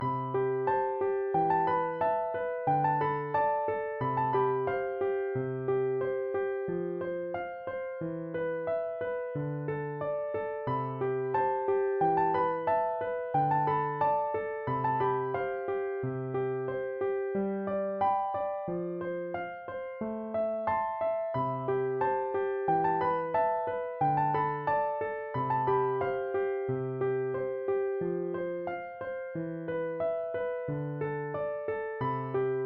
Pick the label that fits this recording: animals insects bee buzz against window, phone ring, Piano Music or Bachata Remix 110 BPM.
Piano Music